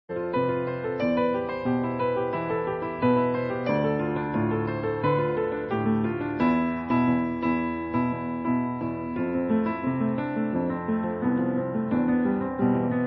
Piano

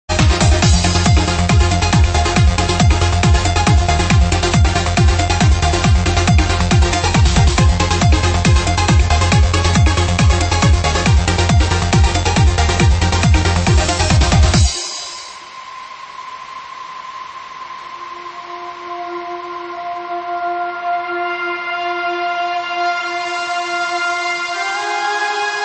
Modulation

